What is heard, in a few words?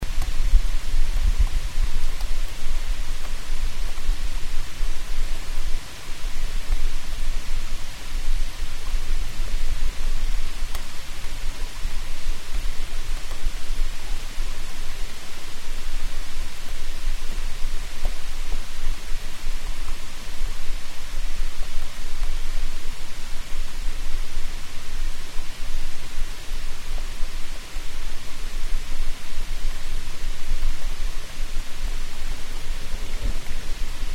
rain; Martha's Vineyard